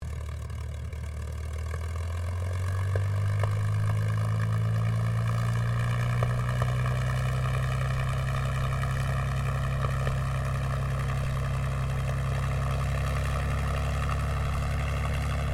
AIRCRAFT CAC CA-18 MUSTANG MK23 OPERATING IDLE SHUTDOWN STEREO, WW2 Mustang Fighter Plane; Aircraft Cac Ca-18 Mustang Mk23 1950